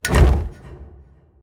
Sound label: armor; smite; chaos titan